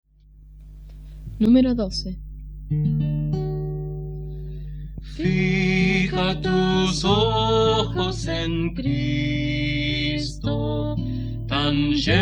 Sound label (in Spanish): Coro, himnario niños